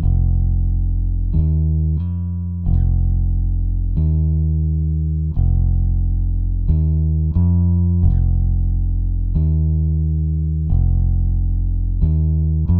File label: bass; Toki Tori Collection